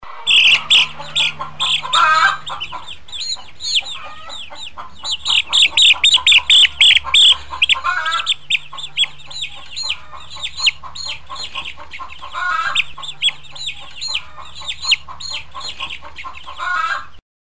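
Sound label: sound of chicks in chicken coop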